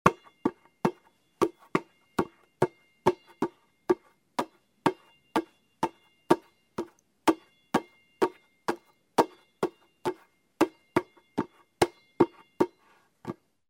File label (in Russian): basketbolnyj mjach